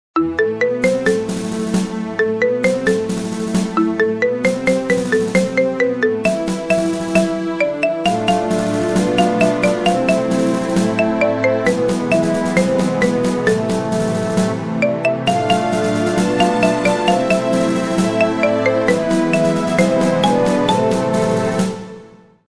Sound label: Marimba Cover Marimba Ringtone; Bella Ciao